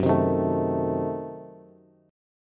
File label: Gb Diminished 7th Bass; strummed; JGuitar